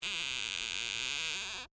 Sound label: Door Squeak Wood, Foley, Door, Squeak, Wood; Garage Door Sound Effects; BlastWave